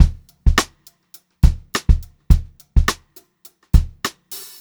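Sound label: 104 straight beat, Funk Groove Drumloops; USB Soundscan vol.38; AKAI; 90 s Sample CDs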